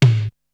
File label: Tom, Drums; ZERO G - Datafile DISC 2